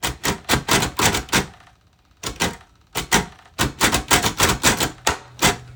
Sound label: brailler typing; Golden Crayon Sounds